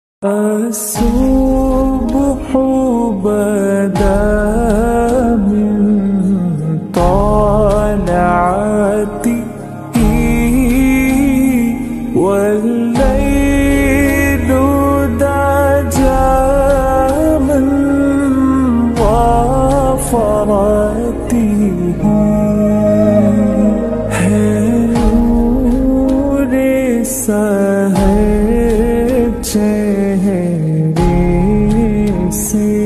islamic ringtone islamic tone

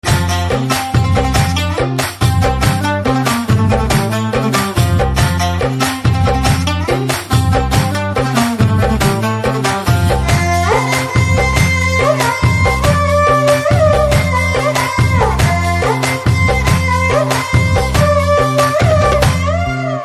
BGM Instrumental Ringtone; Uppena

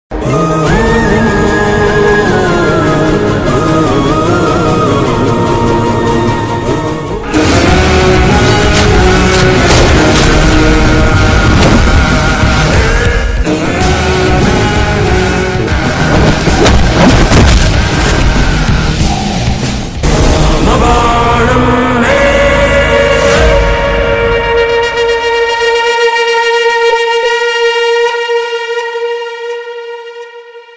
new bgm ringtone south indian movie bgm ringtone

